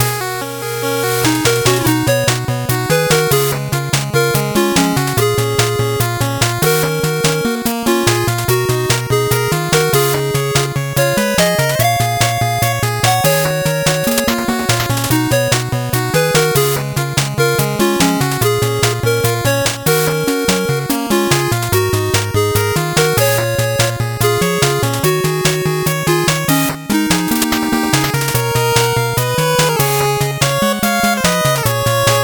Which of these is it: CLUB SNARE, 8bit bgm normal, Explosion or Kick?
8bit bgm normal